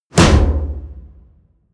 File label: percussion